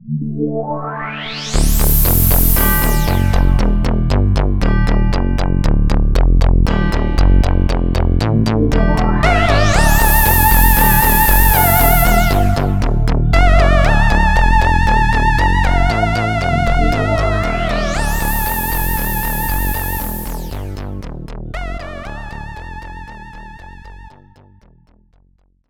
start wave; The wave was start.

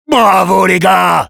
cheers, fr

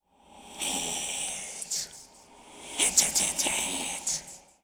Sword idle

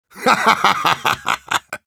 laugh happy, ru; Engineer

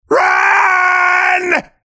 Sniper run

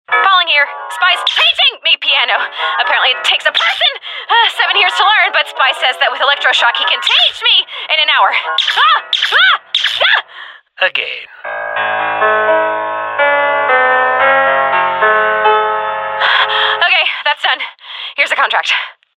piano

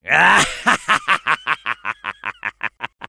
laugh evil, fr; Medic

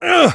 Spy taunt exert

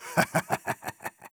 laugh evil, de; Engineer

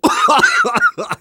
Engineer laugh short

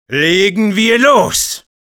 battlecry, de; Legen wir los.; Sniper

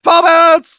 battlecry; Vorwärts!; Medic Sprachkommandos

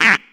Bumper car quack

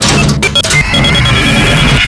sentry buster spin

